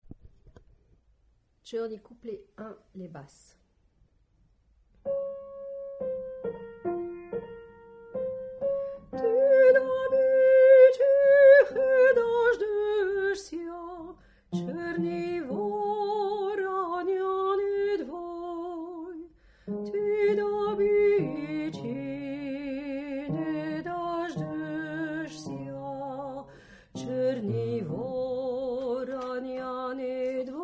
Basse

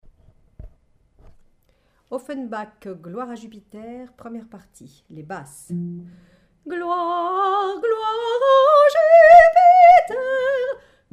Basse

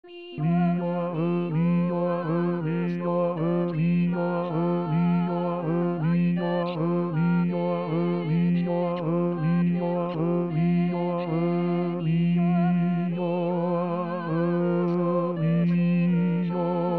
Basse

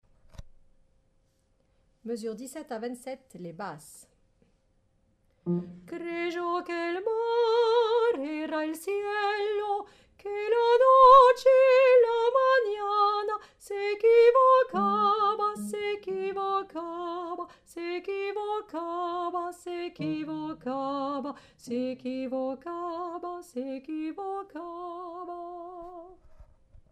paloma Basse

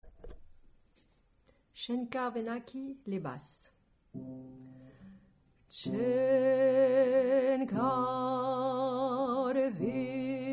Hommes; Shen Khar Venakhi

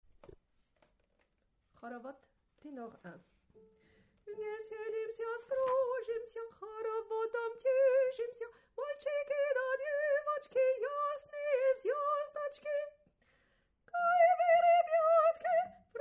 Tenor; Haravod

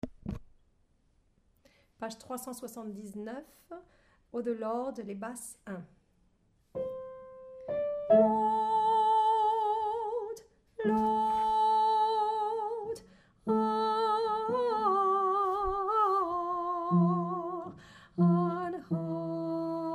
Basse; Basse1

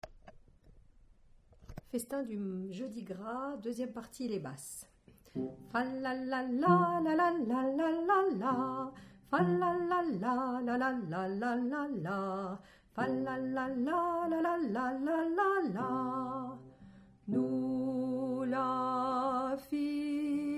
Basse

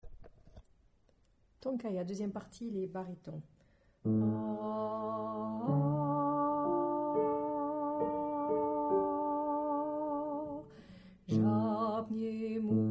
Baryton

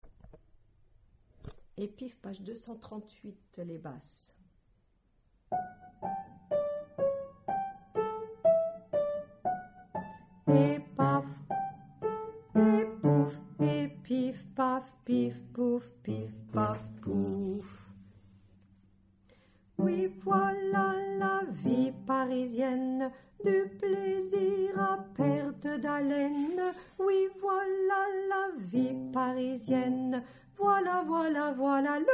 Basse

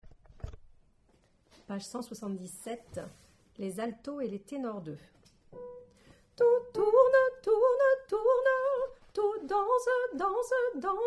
Alto Tenor2

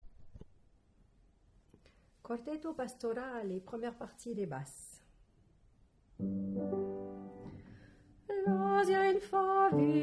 Basse; quartetto

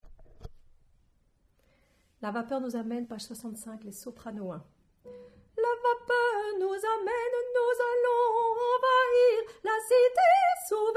Soprano1

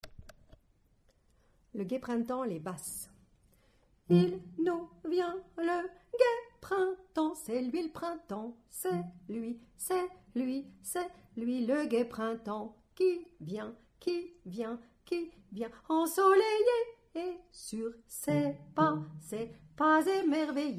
fanfare Basse

